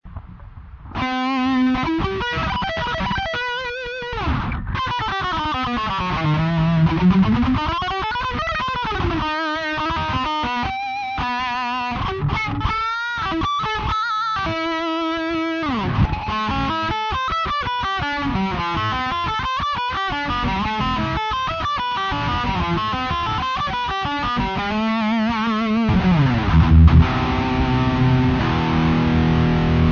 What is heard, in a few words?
SD solo